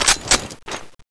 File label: claymore shoot, weapons